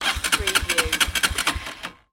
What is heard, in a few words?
Motorcycle Dirt Bike Engine Turning Over